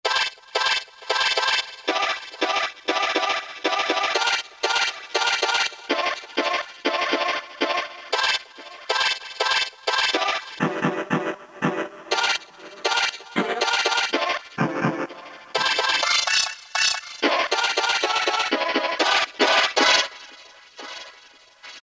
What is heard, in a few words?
VOCODER, Casio Rapman; Tones